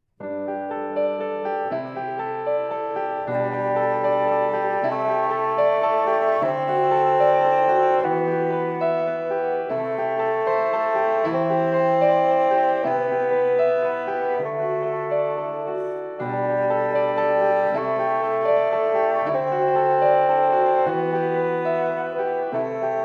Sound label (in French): la douce brume, basson; sol